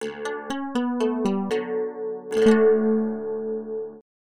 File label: gtr nylon